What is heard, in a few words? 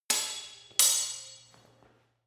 sword hit fast sword double